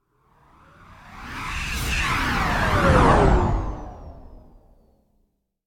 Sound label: Space Ship By - Slow Down; Hollywood Edge; sound designer's toolkit v2